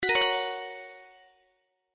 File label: Scatter Symbol Win; slots; Pirate Quest